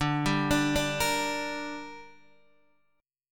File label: D Suspended 4th Sharp 5th D down Guitar DADGAD, chord